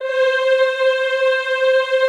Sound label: C4, Classical Choir; Best Service; Extended Classical Choir